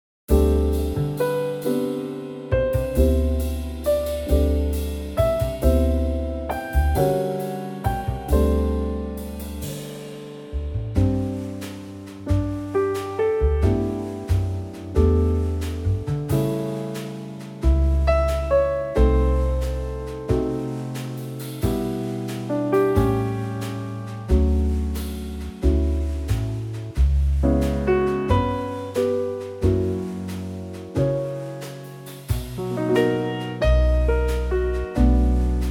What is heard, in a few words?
G trio